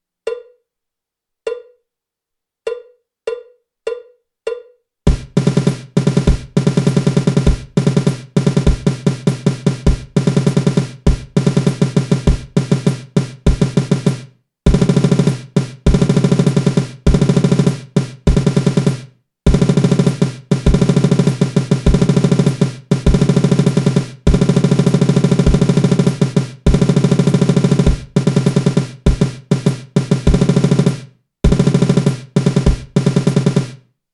架子鼓独奏 热身独奏; 66条架子鼓独奏SOLO

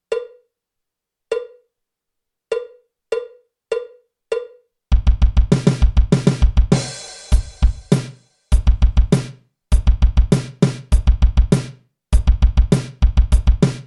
架子鼓独奏 硬摇滚; 66条架子鼓独奏SOLO

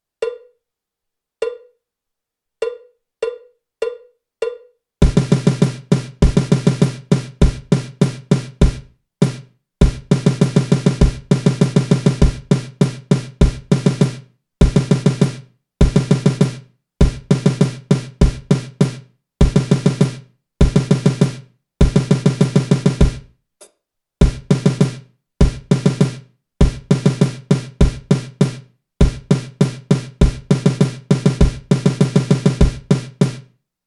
架子鼓独奏 热身独奏; 66条架子鼓独奏SOLO

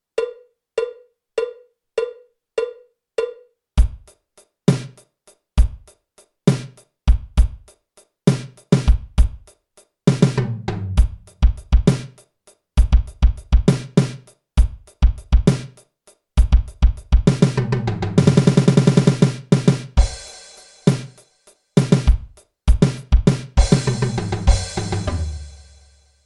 架子鼓独奏 八六拍; 66条架子鼓独奏SOLO

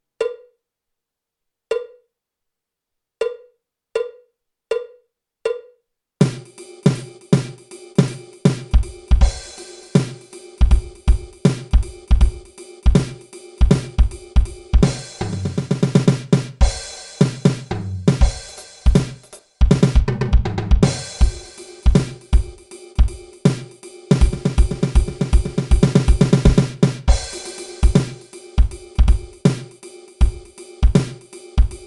架子鼓独奏 慢切分; 66条架子鼓独奏SOLO

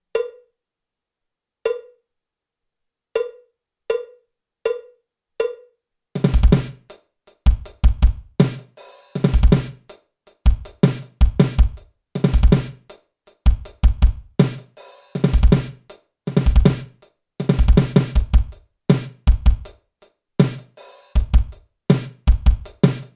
架子鼓独奏 爵士融合; 66条架子鼓独奏SOLO

